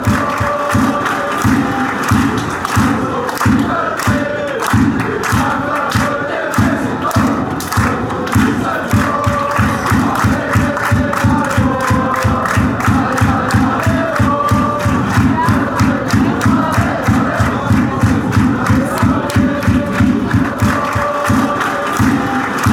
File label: ale ale aleo thun, chants